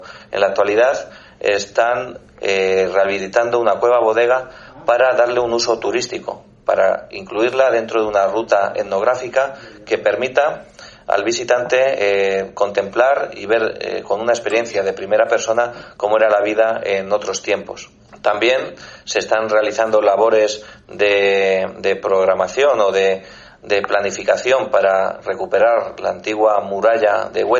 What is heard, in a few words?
jazz